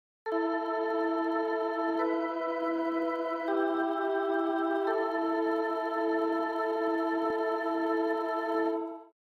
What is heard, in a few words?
Space Voice; Syntheway